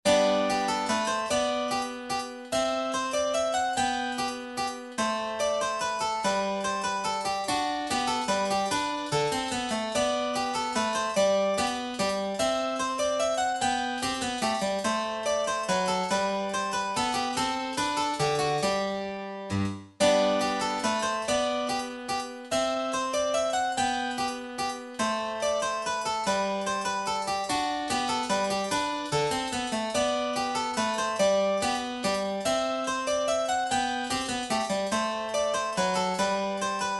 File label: minuet, midi; song book